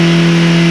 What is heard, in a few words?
ENGINE; Andretti; INDY